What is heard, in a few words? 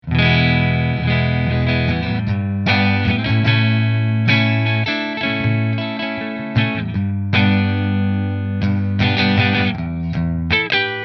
Parker Neck Fender, guitars